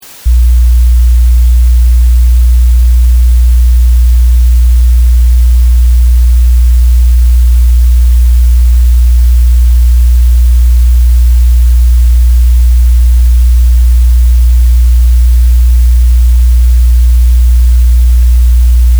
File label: 60Hz White Noise